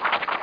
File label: page flip; Magic - An Insider's View